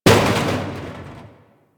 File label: Destruction Small Metal Hits; Ambience